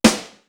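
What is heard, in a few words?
TITE SNARE; East West; Global Sounds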